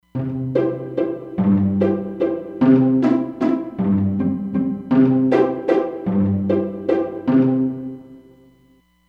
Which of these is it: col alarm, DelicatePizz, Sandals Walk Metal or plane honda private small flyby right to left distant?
DelicatePizz